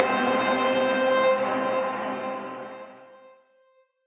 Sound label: Retry Jingle; Friday Night Funkin' Mii Funkin' vs. Eteled